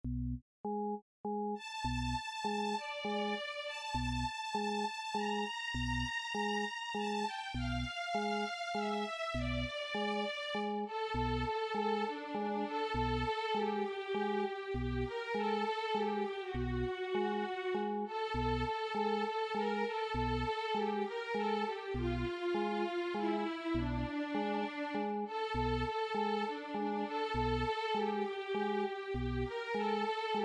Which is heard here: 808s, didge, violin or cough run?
violin